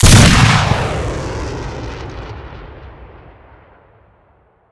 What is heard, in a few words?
heli rocket shoot; STALKER; IWP